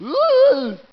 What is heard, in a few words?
Pain; KKND